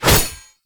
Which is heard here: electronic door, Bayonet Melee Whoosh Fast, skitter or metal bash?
Bayonet Melee Whoosh Fast